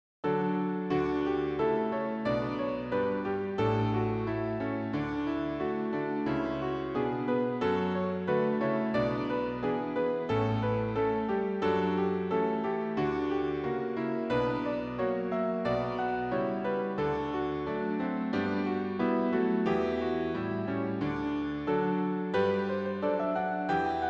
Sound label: hymn